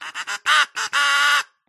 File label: duck call fanfare mid-high stocato off key; Skyclad Sound